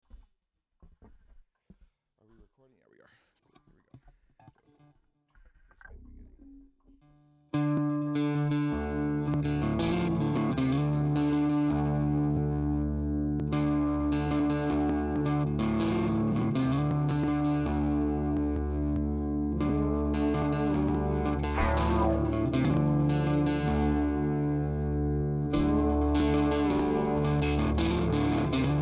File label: bells